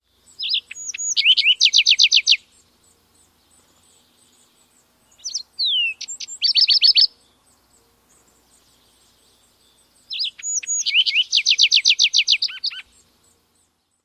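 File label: songs, lark sparrow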